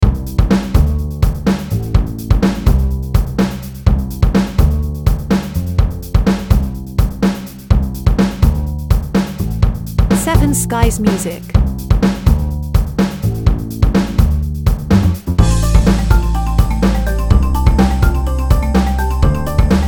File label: Pop Rock